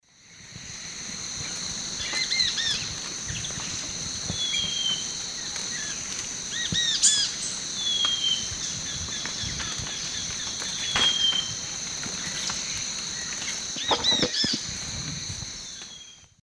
Myrmotherula longipennis zimmeri, calls, S. America Eastern Lowlands